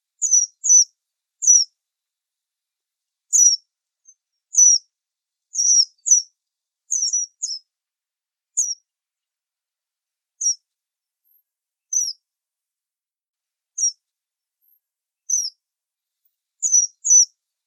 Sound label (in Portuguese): Canto de diamante estrela